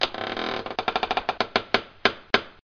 doors creak ringtone

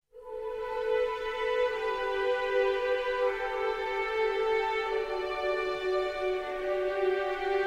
calm sms tone

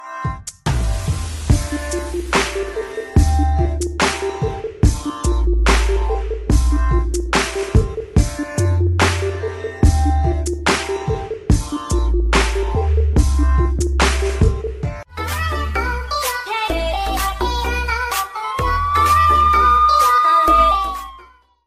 english music ringtone

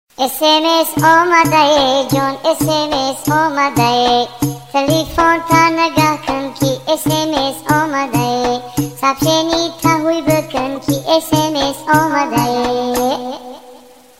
sms tone ringtone

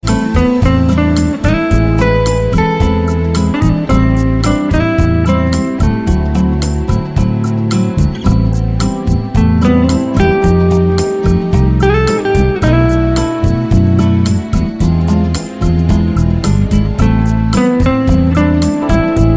flamenco guitar ringtone

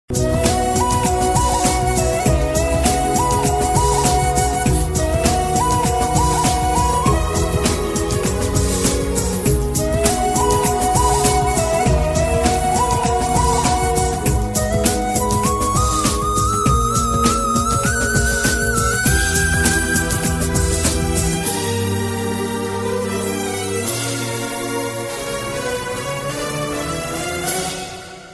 background instrumental music tone ringtone

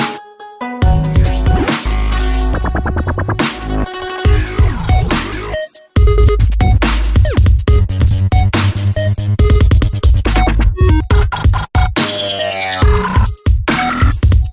dubstep ringtone; nokia

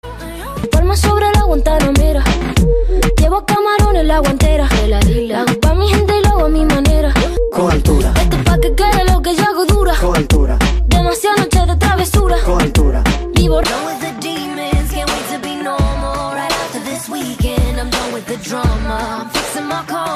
cool hollywood ringtone

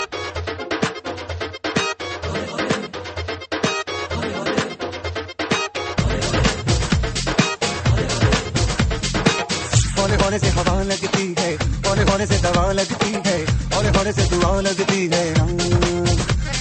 dhol mix ringtone

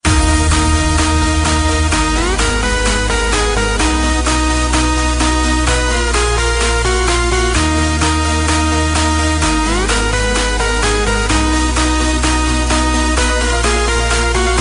electronica ringtone

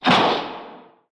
bag burst ringtone

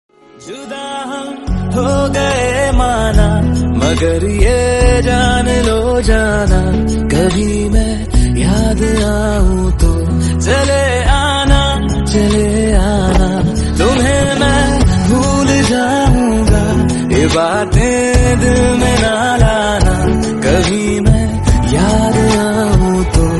bollywood song tone ringtone

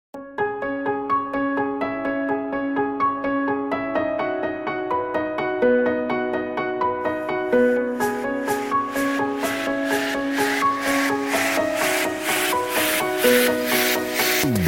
piano music ringtone

